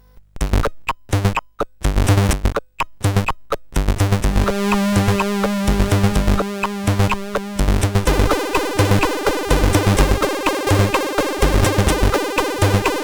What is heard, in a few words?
Trebbler, sid CSG8580R5